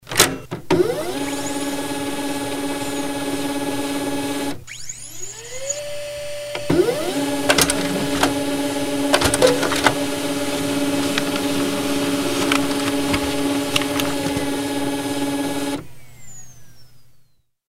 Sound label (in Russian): Печать старого лазерного принтера